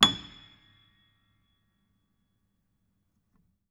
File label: Note High, Piano; Piano Miscellaneous